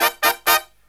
Killer Brass Riffs; USB Soundscan vol.29 - Killer Brass Riffs; AKAI

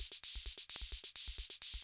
hihat 2, 130, drum loops; Ueberschall; Techno Trance Essentials

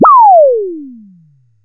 TOM KIT A4, TOM SYNTH; USB Soundscan vol.20 - Fresh Disco House I

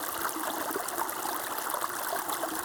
Waterway G Dark

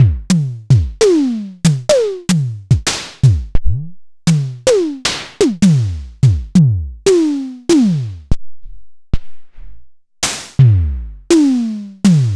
Tama TS-206, Samples Sliced; m8

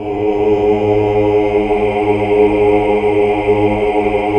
HAL AAH. AAAH Uni

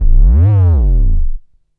Bass Lick, Drum & Bass; Fluid Dynamics

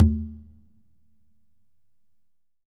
Congas wet, drums percussion

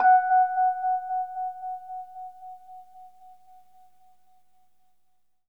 Rhodes fast F#; 3D Sound; 3D Keys VOL-1

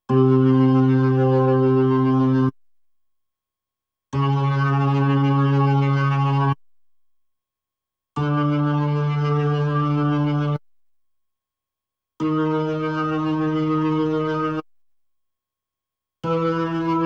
B3 Organ; Masterbits CLIMAX 8

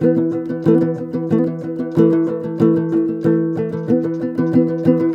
TOPSHUR; Soundscan vol.60; Asian Traditions; AKAI

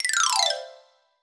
Xylophone; musicradar; cartoon caper

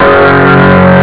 eguitar; Amiga Soundtracker Sample Packs

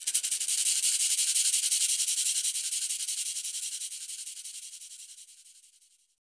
Afro Shakers, Shake & Rattle; Roland LCDP14 Africa VOL-2

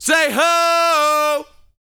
VOCAL, R&B Dance, HIP-HOP

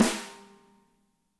MEDSNR, ROCKER KIT

